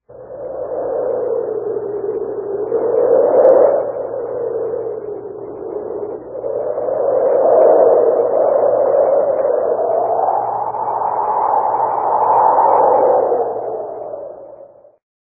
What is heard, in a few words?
Wind and Thunder